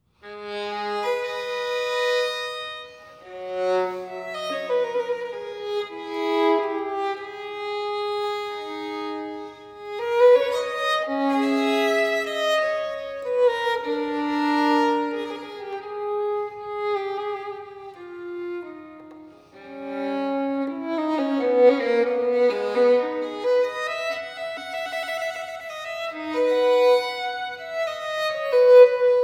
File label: Violin Sonata in A Minor Grave; Violin Sonata No. 2; BWV 1003; Bach